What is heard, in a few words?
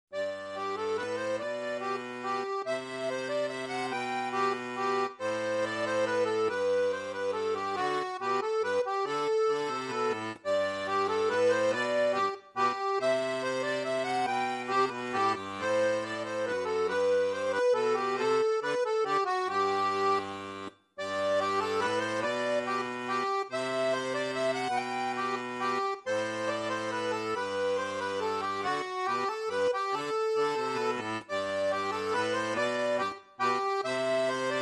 g major menuet, classic